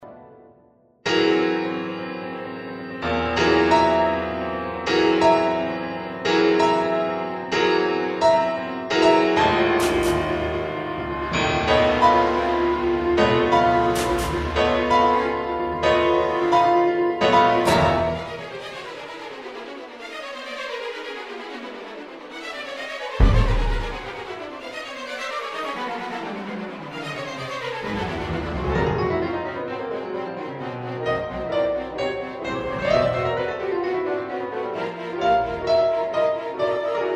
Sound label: concerto